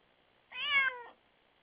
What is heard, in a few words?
cat meow